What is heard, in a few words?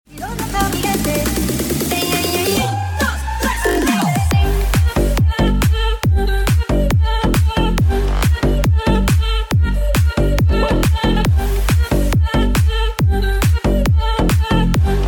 clipdance children 137bpm